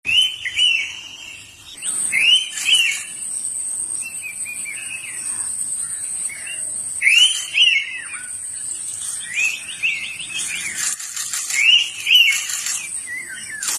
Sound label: ringtone